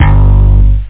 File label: Slap Bass